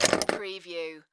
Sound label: Pinball sound, mechanical movements